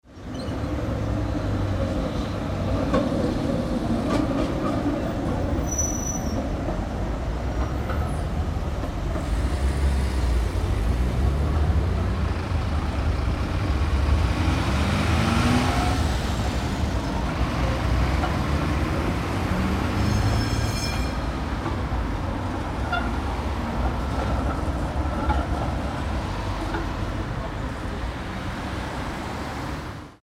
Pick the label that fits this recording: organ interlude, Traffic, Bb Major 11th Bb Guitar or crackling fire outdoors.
Traffic